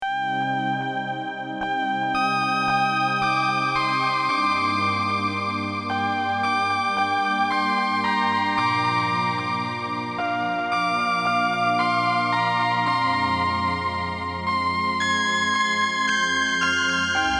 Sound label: oberheim; antilles